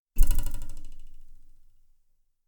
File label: Ruler Bouncing